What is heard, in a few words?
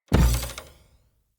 Bow Shot Charged Twang; Weapons; Player; Josalfar Bow